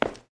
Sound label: tile step; Golden Crayon Sounds